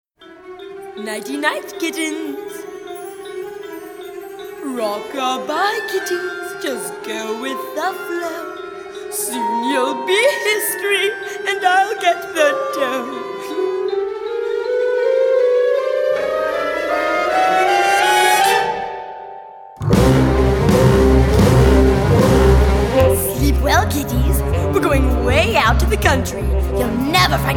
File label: Night Kittens Guide Vocal